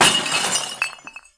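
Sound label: destroy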